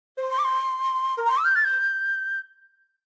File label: Dialogue Box (Shakuhachi); B Path of the Teal Lotus